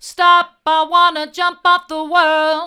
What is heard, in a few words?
stop i want to jump off the world hard, vocal